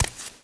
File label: walk; The Elder Scrolls III - Morrowind; FOOT